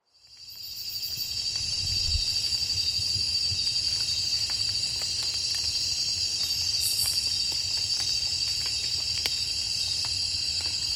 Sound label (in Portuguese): Amazonia sementes caindo grilos passaros; Rainforest; Gravação de campo; catalogo paisagens sonoras